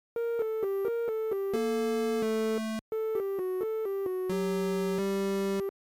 Retro 16 bit or 8 bit game over tune

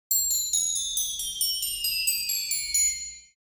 Cartoon crotales scale

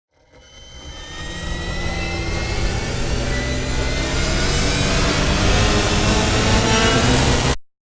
Metal wires intense riser

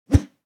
Text whoosh

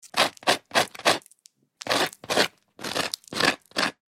Toast butter spread butter knife

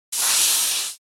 Steampunk machinery compressed air release hiss

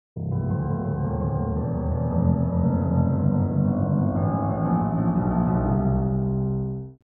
Cartoon danger

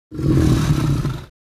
Dragon quick growl warning

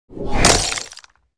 Ice magic spell small impact; fantasy

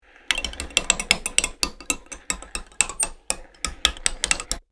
Gear mechanism rotating clicky; gears

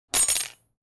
Dropping a knife onto a hard surface heavy impact

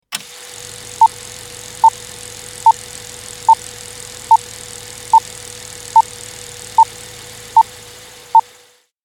Vintage film projector countdown 10 seconds

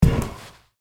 Footsteps on metal surface boots single step

